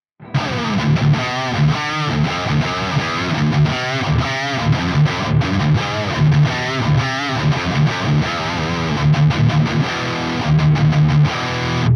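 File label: RGT1270 rhythm all positions Riff, guitar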